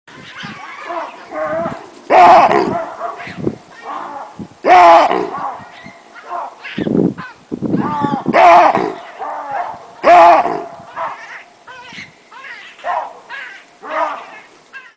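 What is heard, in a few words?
classic single bark, animals; jivotnyie; klassicheskiy odinochnyiy lay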